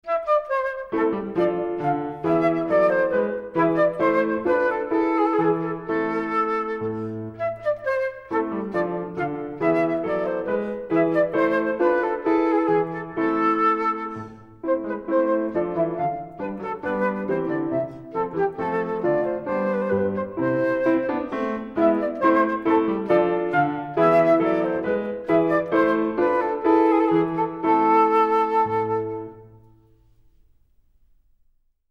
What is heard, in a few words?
performance Flute; Krieger; ABRSM